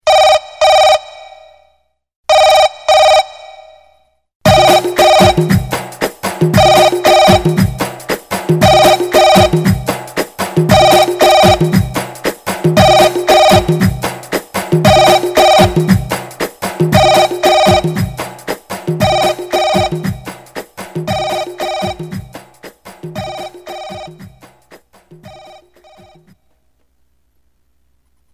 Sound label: Ring Ring